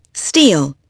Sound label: steel